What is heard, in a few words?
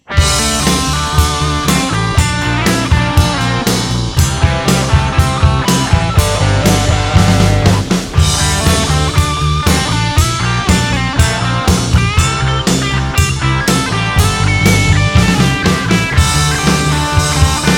Plexi; Kemper profiles; Plexi2